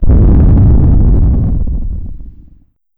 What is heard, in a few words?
large explosion